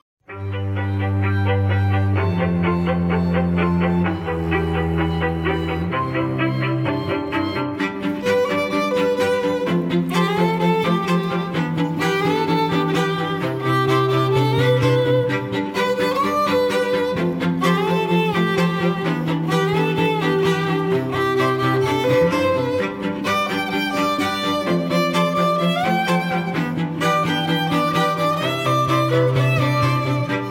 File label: instrumental